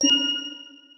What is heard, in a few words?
Alarm